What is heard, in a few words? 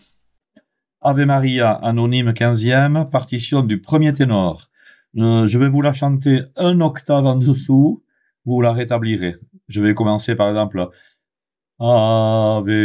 tenor; Ave Maria anonyme XVeme